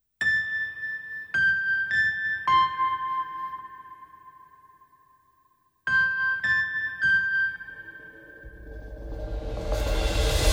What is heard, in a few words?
round ten sec count, music